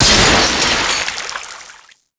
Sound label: break water cooler; breakable